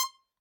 Hohner Special20 F Accented rel C5, Harmonica, Releases; Aerophones; Free Aerophones